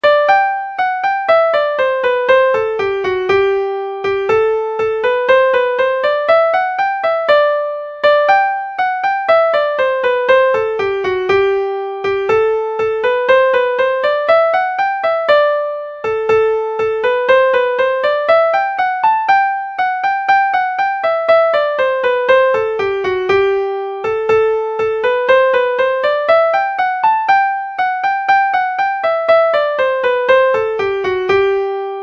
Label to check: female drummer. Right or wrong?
Right